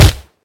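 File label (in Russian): четкий резкий удар кулаком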